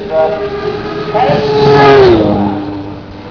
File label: grunt